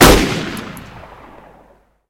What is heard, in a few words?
sniper